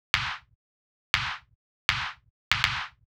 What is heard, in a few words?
Clap; hydrogen